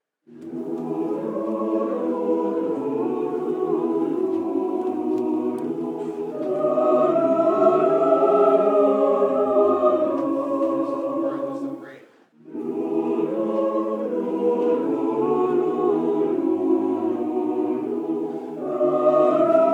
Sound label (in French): chorale; le docteur Banjo